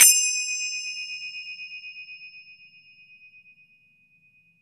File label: FINGER CYM; FINGER CYMBAL 2